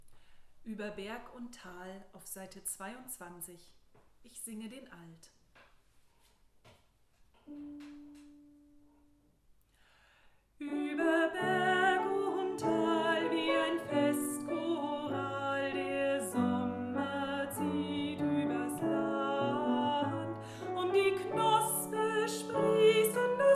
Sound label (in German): Ueber Berg Alt; Chorfest der EKM auf der BUGA am 26.06.2021